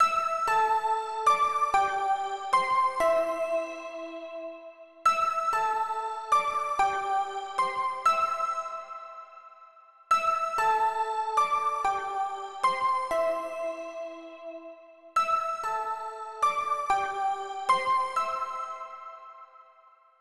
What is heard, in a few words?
Pluck Melody